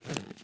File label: Clothing